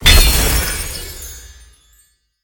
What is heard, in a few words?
pwr aoe stun; smite; tiamat